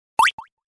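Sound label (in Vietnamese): tiếng cầm lấy lượm nhặt đồ vật hoạt hình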